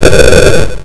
Big Boom; Centaurian

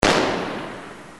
gun shot; army

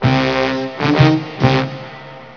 Dragnet Beep; HyperInstaller

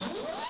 enlarge; Ultranium 3D

